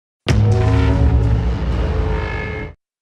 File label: Thanos gloves; دستکش تانوس